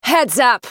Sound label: spell cast effort, en US; Sivir